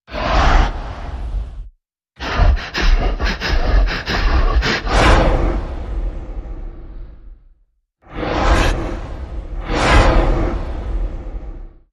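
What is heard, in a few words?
Creature Chuffs and Grumbles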